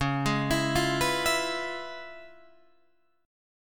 C sharp 7th Sharp 9th, C sharp, down, Guitar, Open D